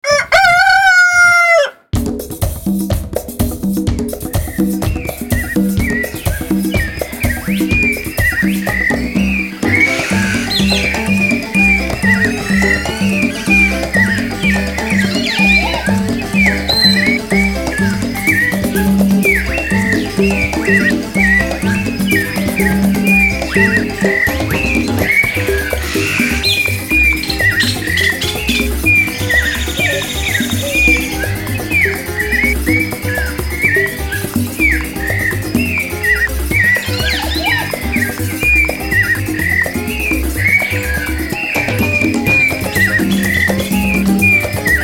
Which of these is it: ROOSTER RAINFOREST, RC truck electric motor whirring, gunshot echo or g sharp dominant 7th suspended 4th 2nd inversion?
ROOSTER RAINFOREST